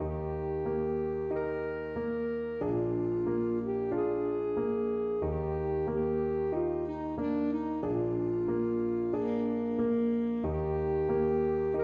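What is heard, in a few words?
alto saxophone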